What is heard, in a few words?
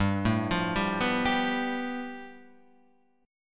A 7th Suspended 2nd Sharp 5th